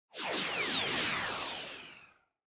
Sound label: Motion Speed Lines Long; モーション スピード線